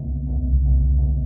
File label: ftl hyperlane charge ship; ambient; Stellaris